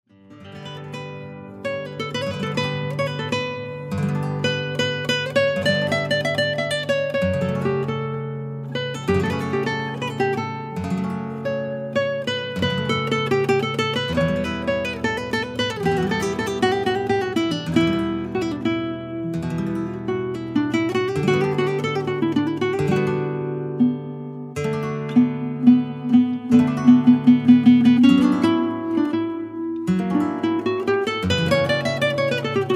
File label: Adagio, gitarre flamenco